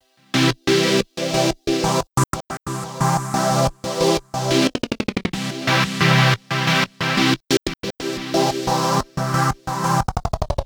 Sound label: Pad, хип хоп gater pad